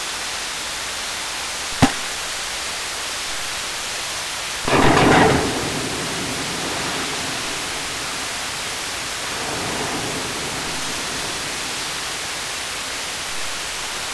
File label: Bottle Implosion; CalCOFI Cruise